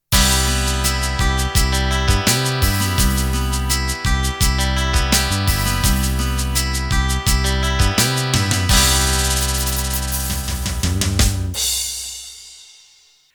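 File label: Rock Ballad Intro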